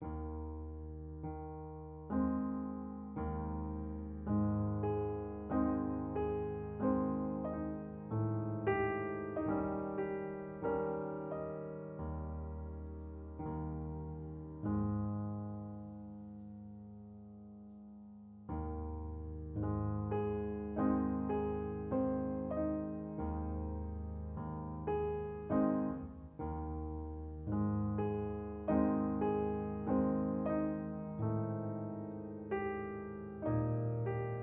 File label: Practice Session Maple Leaf Rag; aiming for perfection